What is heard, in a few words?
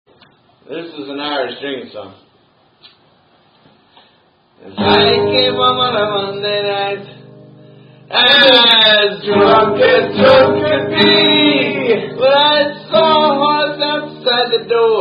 Irish Drinking Song; Post Power Hour